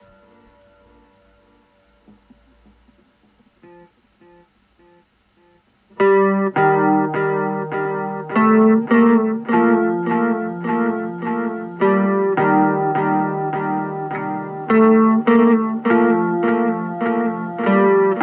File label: bullet spin